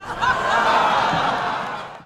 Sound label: crowds theater laugh theatre 500 people ahh ohh sweet; خنده کوتاه جمعیت; human sounds